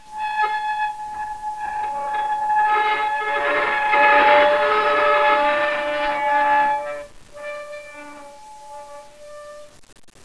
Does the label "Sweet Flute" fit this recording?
No